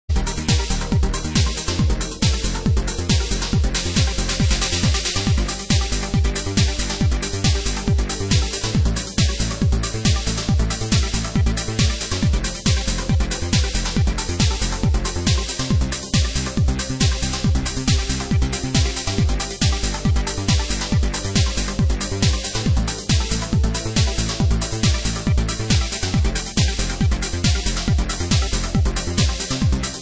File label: Tranced Up; Scumball